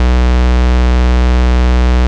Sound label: Root B, Ultimate Bass; Vengeance Samples